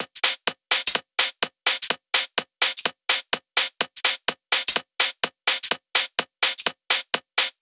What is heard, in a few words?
126BPM Drums; Vengeance Samples; Inception Kit